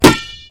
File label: basketball